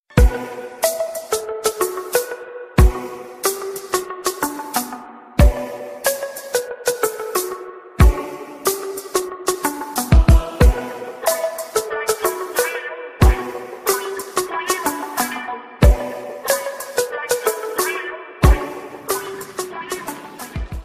Hip Hop Beat

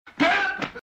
Pip

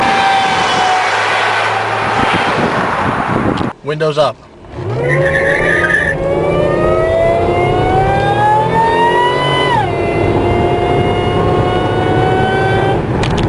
Whine

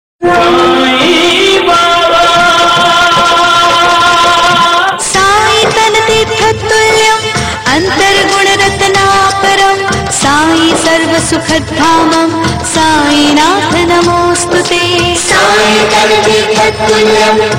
Sai Aarti

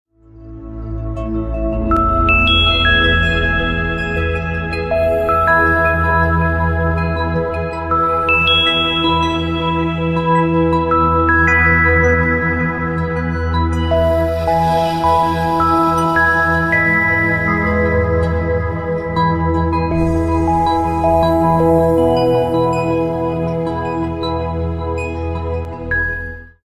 Bells Sound

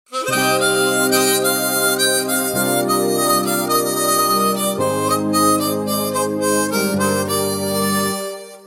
Mouthorgan

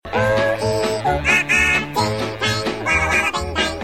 Chipmunk

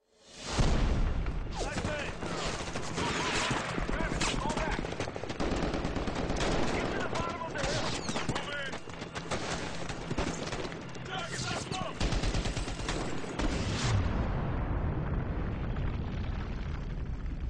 Army Gun Shots

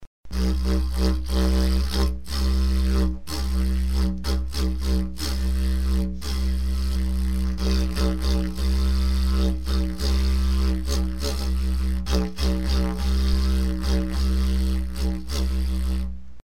Didgeridoo

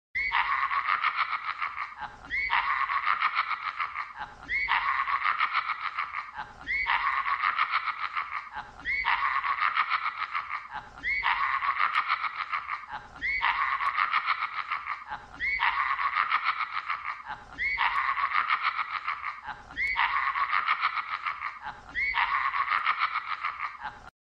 Laughing; Joker

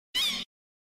kWEH

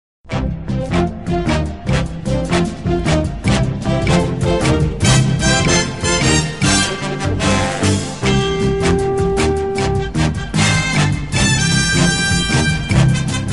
Hockey Theme Music

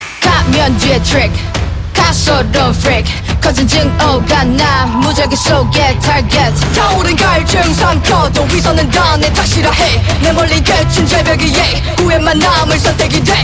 Scream Rap

